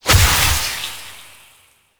Projectile Death Medium; Weapons; Warframe; Duviri; Incarnon Adapters

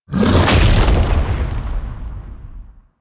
Infested Splitter Attack Slam; Warframe; Grey Strain

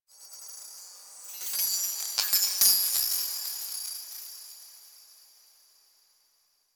Glass Texture Small, Ambience; Warframe; Cephalon Weave; DE

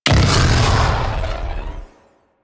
Arch Gun Alt Projectile Explosion, Weapons; Warframe; Archwing; Hildryn

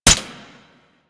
Mech Full Auto, Pistol; Weapons; Grineer; Amphis

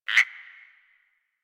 Forest Frog Close; Warframe; Grineer Forest

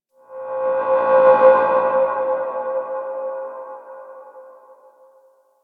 Clan Dojo Misc, Ambience; Warframe; Dojo Randoms

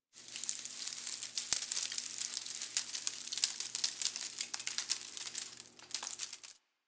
Derelict Leave Drop, Ambience, Interior; Warframe; Orokin Derelict

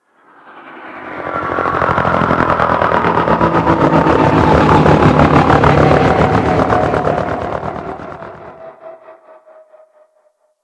Drop Ship Arrive Distant; Warframe; Vehicles; Grineer Drop Ship

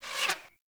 Hunter Bow Projectile By; Weapons; Enemy; Bows

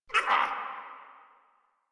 Pain, Grineer Male; Warframe

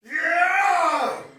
Battle Cry, Soldier Male; Sinecure

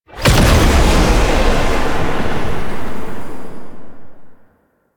First Transformation Stinger; Enemies; Warframe; Entrati Doppelganger

